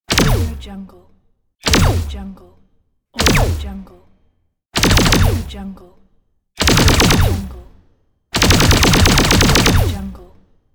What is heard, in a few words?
laser gun